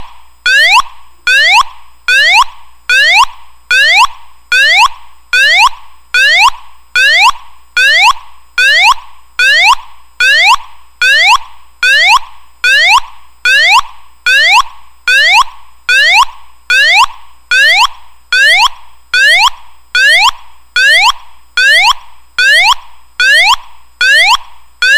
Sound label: special warning